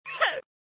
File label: hiccup